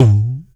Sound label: VOC DRUM, MOUTH PERC; Best Service Hyper Dance